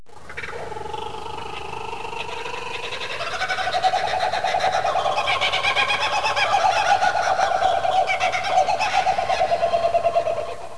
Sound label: Rain Forest Menu; 250 Desktop Themes